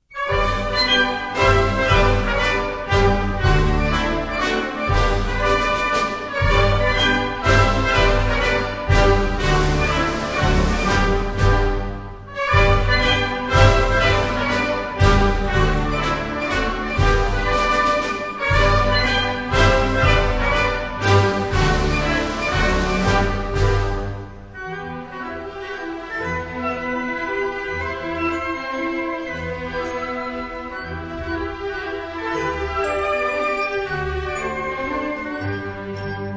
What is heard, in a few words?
Mazurka